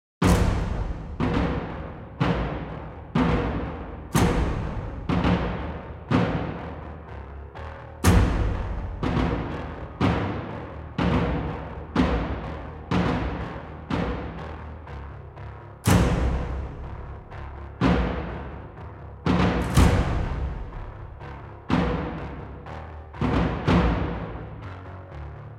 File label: Action 123bpm, Classic Horror Sci-Fi Music; Dead Ark